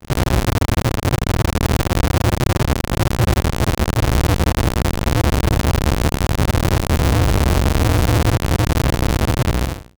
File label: 8 bit laser shoot; video games